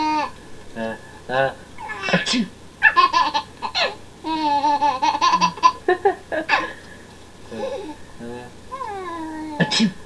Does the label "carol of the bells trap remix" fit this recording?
No